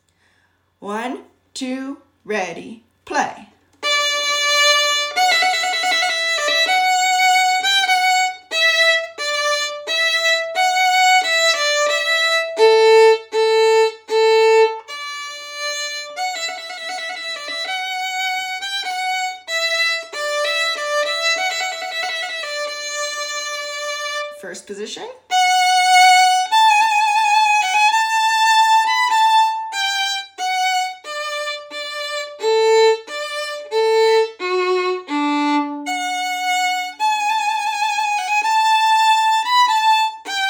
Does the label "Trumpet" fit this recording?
Yes